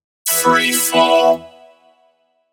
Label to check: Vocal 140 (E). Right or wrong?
Right